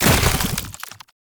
Infested Move Turn; Warframe

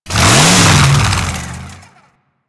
Saw Forward Combo Rev, Melee Weapons; Warframe; Grn Ghoul Saw Player

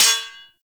Melee Sword Impact Generic, Swords; Weapons; Impacts

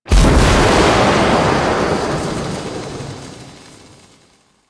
Void Dragon Firebreath Cast Lightning; Enemies; Abilities; Warframe; Duviri

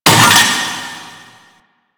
Vaults Stake Gate; Warframe; Entrati

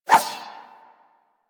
Rapier Whoosh; Swords

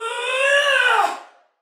Battle Cry, Female, Soldier; Dialog; Barks; Sinecure

